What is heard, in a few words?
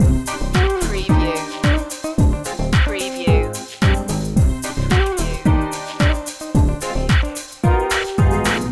tango chill loop